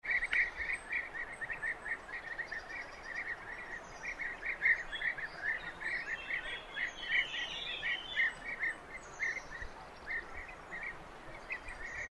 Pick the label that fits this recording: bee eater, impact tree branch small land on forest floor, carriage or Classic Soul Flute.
bee eater